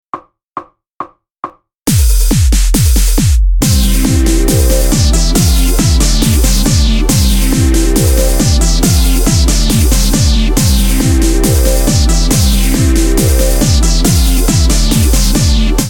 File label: rhythm